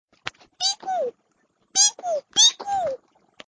Cute Cute Sms Tone

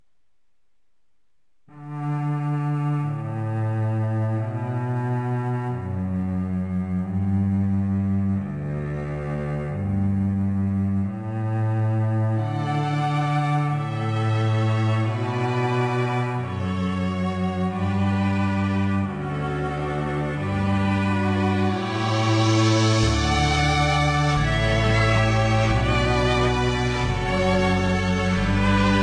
Canon Soft Version

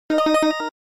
Harmonics Motorola

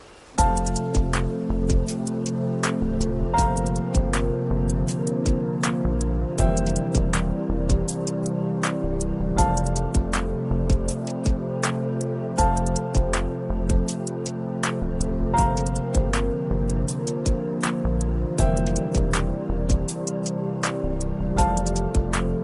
Samsung Best Tune

